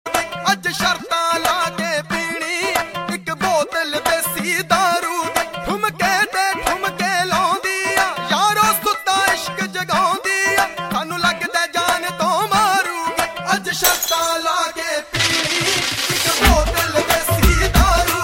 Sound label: Punjabi Desi